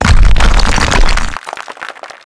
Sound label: fence stone; World of Tanks Blitz